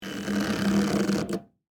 Metal Handle Squeak, Foley, Metal, Handle, Squeeze, Grip; Machinery (Sound Effects:Machines)